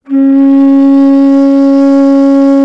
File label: BASS BONS, India Winds; E-MU Producer Series Vol. 7 – Old World Instruments